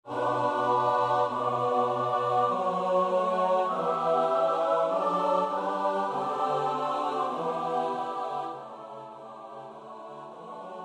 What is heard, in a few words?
midi; nowowiejski; msza polska bogu rodzica ofiarowanie